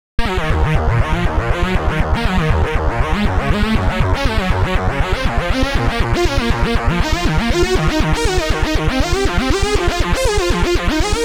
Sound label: technetium arp; the space soundset